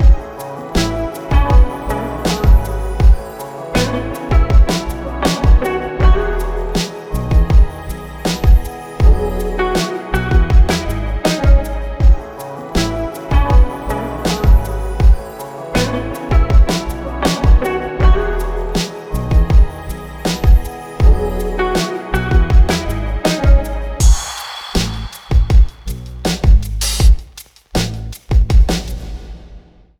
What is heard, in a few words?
Desert Rhythms; yuttari